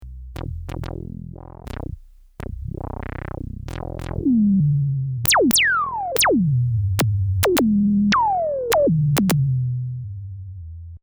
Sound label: ARP in ResoZap